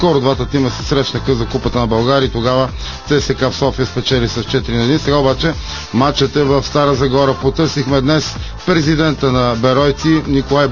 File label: gong